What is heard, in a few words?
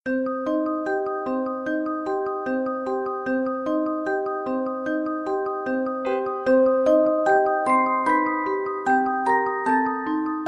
questioning (allegro)